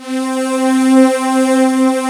JD990

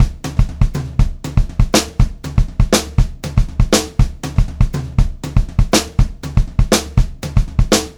BEAT, Pop-Rock Drumloops; USB Soundscan vol.37; AKAI; 120 SPEED

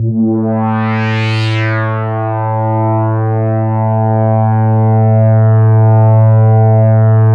MEMORYMOOG A2; Big Fish Audio - First Call Vintage Keyboards

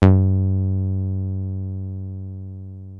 303 F#2, TB 303

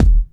Kick, Kickdrum; Equipped Music; Loungin House

